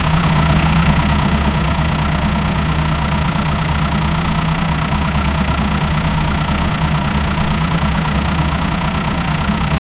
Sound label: engine sound in sport car